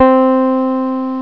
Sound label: synthe